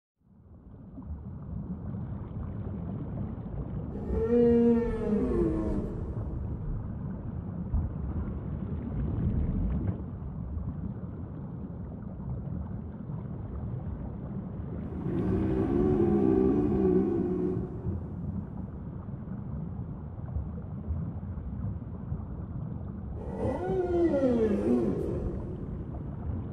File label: Whale Sound